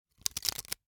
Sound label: Hwatu, card game, hard plastic, small, clatter, stack, drop, tight; Eiravaein Works; Vaeyan IV